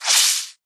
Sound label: bug wound